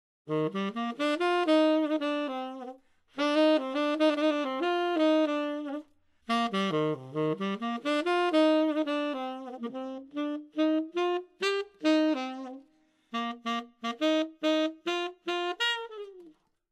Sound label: Jazz Sax